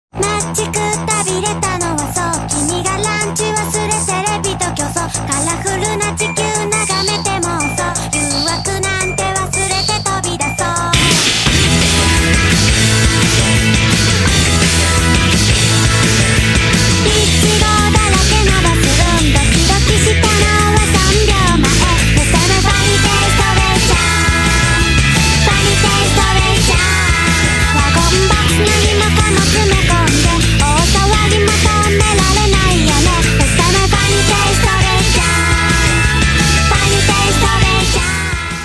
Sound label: music